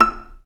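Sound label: Viola Pizzicato E5; Vla Piz; Digital Sound Factory Virtuoso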